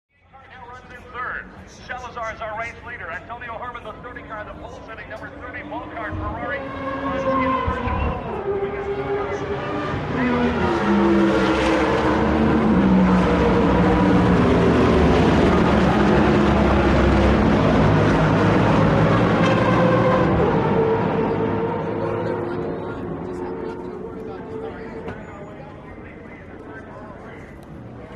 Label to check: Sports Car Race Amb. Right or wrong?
Right